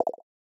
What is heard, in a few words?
Pop